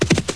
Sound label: galop; DAoC